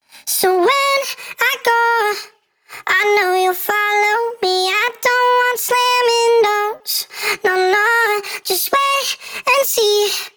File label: male chipmunk WET; metapitch